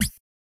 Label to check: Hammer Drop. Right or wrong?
Right